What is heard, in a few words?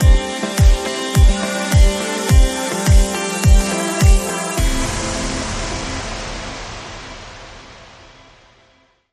Bumper